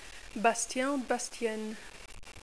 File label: bas; Opera